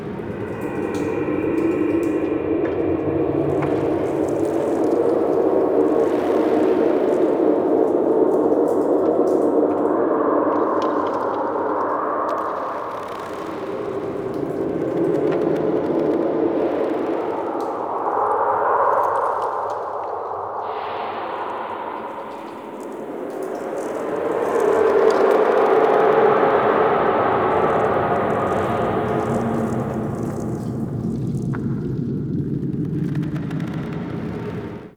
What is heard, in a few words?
Under City Small Cave Ambience, Dungeons; Beds